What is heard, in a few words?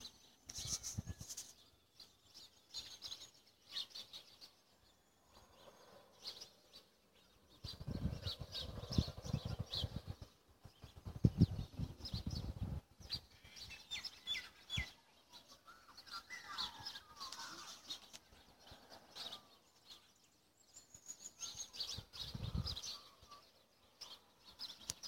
morning magpie